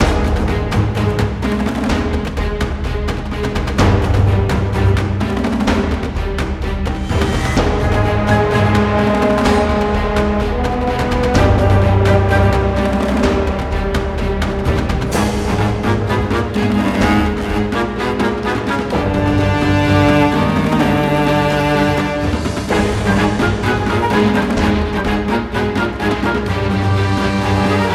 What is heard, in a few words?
bgmusic battle